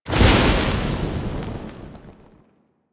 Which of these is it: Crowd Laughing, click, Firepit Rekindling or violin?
Firepit Rekindling